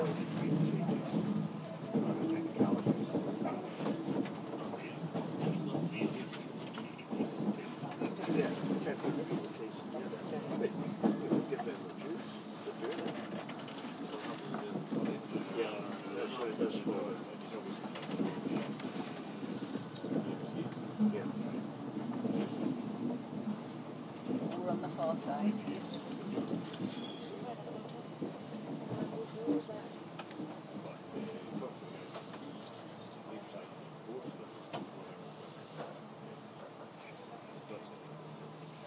ambient train sounds newcastle

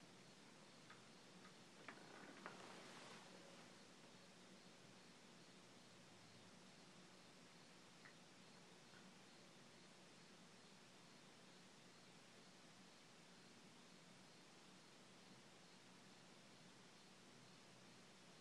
weird cricket

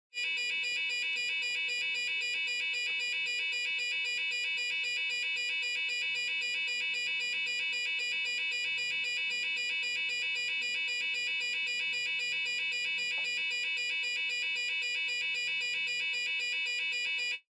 alarm

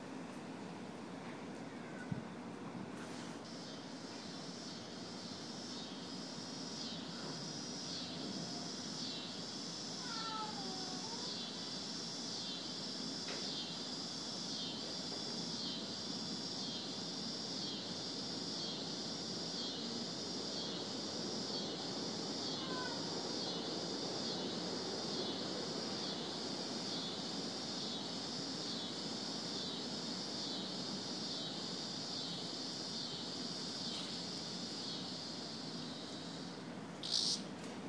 insect sounds from the back porch; with supplementary material by kilgore trout

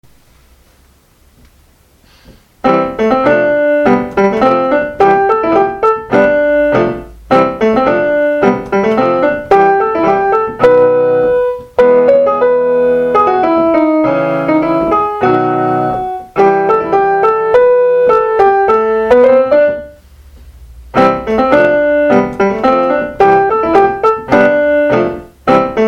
theme on the piano; thunderbirds

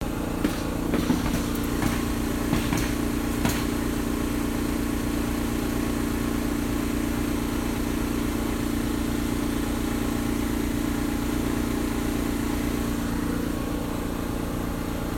noise

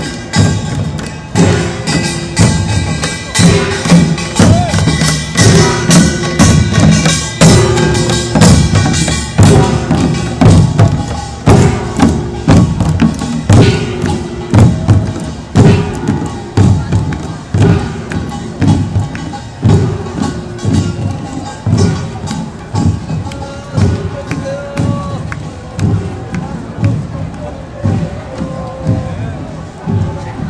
lotus flower festival Korean drums

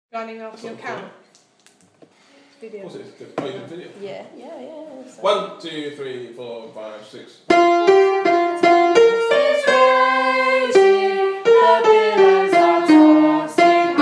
the tempest is raging sopranos chapel folk

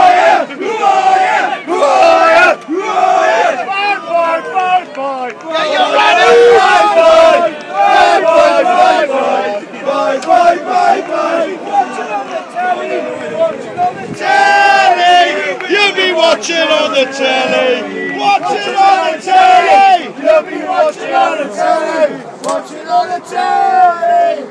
final whistle; wbfc

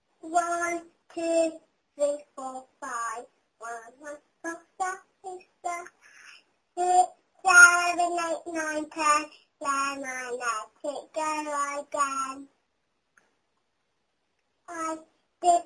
singing

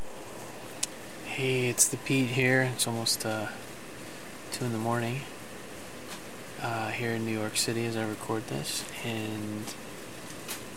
rain and soft thunder in nyc

